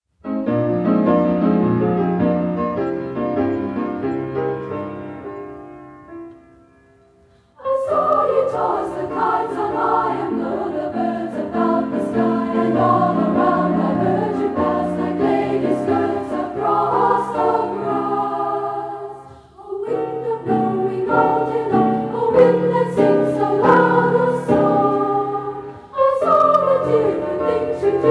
Women's Chorale

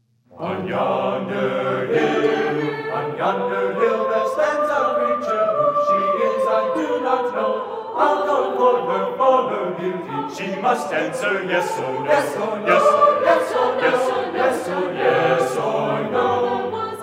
Acappella Chorale; Oh, No John; USC Chanteclairs

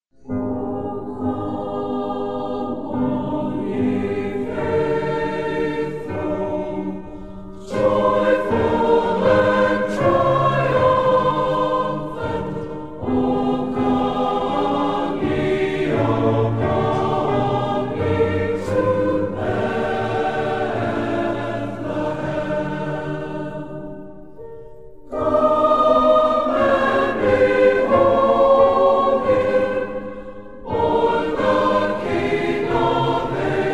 Christmas Choir; O Come All Ye Faithful; USC Pantheon Choir -Pgh

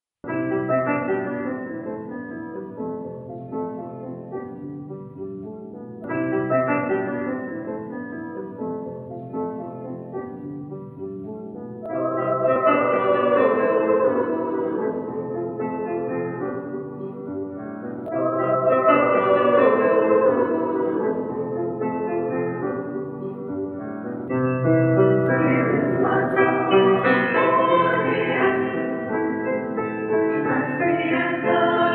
Women's Chorale; USC Women's Chorale -Pgh

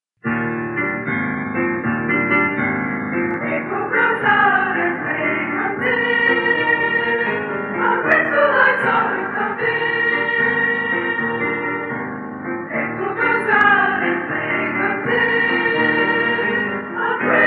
Women's Chorale

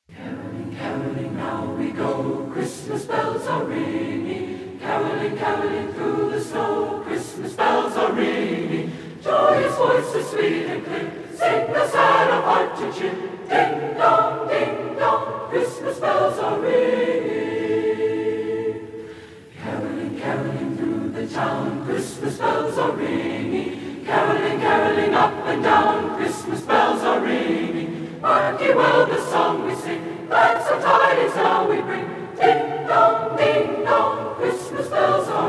Acappella, Choir; USC Pantheon Choir - Pgh

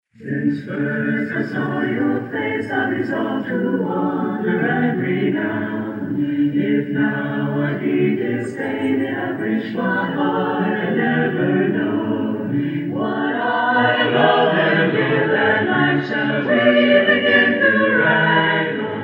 Chorale; Since First I Saw Your Face (Thomas Ford -1607) USC Chanteclairs

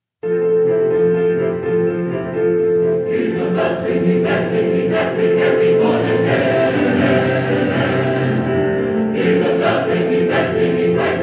Choir; USC Pantheon Choir @ Duquesne Univ -Pgh

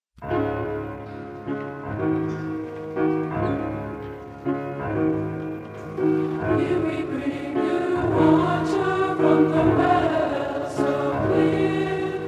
Christmas, Women's Chorale; Britten, Benjamin - New Year Carol; USC Women's Chorale -Pgh

